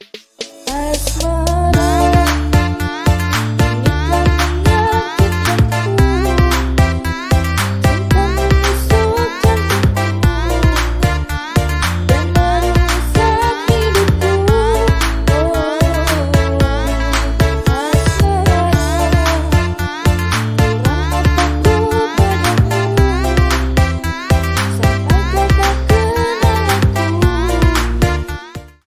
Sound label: Instrumental English Ringtone